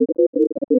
Science Fiction Sci-Fi Electronic Robot Service Droid